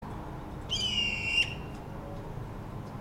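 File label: long whistle of a jay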